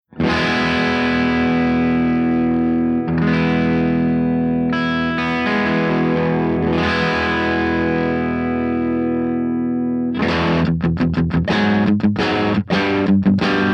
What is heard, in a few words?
FENDER OXFORD P90